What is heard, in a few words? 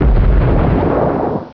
TORPEDO